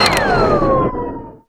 Whirl down; DoomRTX; cgun

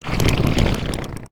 imp chatter, monsters; DoomRTX

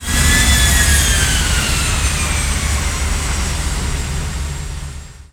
dropship lose eng; Quake 4 Alpha; id software

